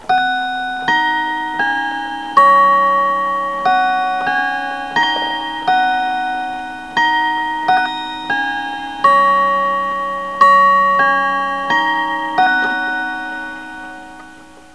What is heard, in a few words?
BIG BEN